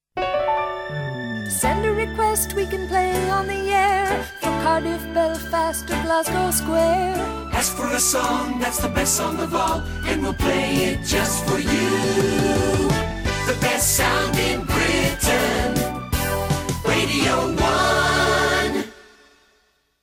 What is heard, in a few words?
send a request jingle